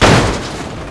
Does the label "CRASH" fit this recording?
Yes